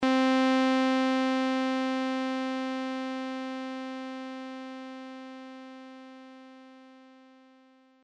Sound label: ADSR; synthese sonore les enveloppes